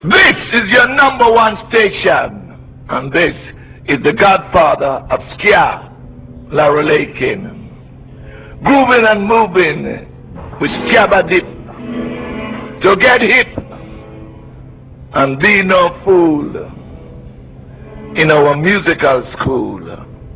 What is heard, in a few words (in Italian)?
jingle